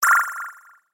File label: Action Chime